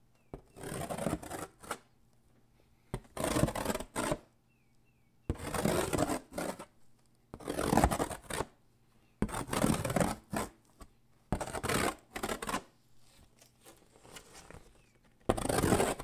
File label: Cardboard Scratches